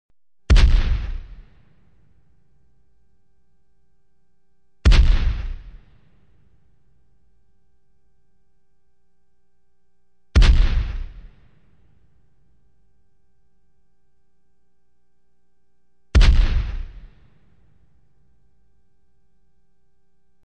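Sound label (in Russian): пушка одиночные выстрелы через промежуток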